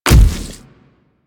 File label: Step Default, Footsteps; Warframe; Infested; Grey Strain; Splitter